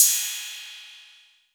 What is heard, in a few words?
Roland 808 CY